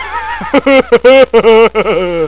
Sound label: laughing; geraeusche menschl